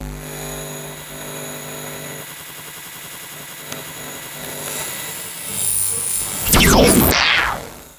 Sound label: harsh electromagnetic static and energy burst; magic-scifi